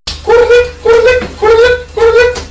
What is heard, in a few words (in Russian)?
kurlik